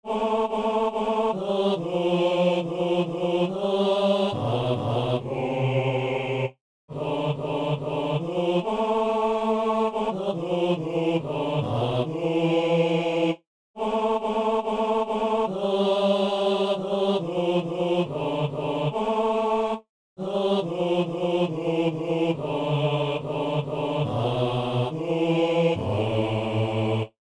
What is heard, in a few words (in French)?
basse; Saint-Denis